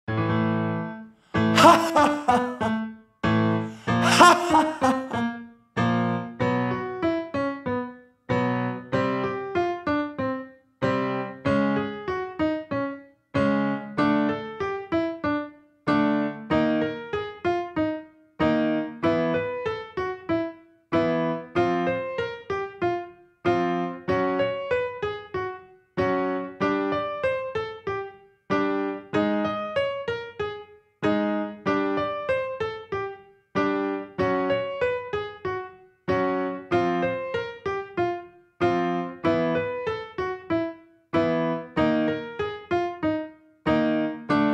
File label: laughing; dynamics; Это вокальная техника, используемая для тренировки голоса и улучшения артикуляции, дыхания